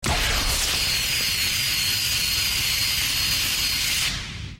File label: romulan disruptor